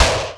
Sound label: HOUSE CLAP, Drums Dance & Groove; USB Soundscan vol.11; AKAI; HOUSE KIT